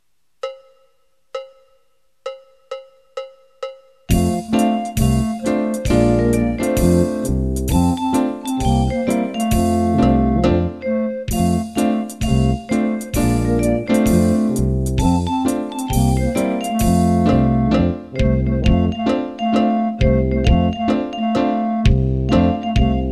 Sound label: Jazz 132